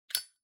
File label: Lighter (Open)